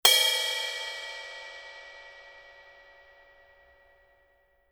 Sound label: 2002 black 18 big beat bell, paiste